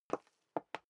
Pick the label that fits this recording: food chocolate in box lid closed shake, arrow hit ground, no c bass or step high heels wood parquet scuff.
step high heels wood parquet scuff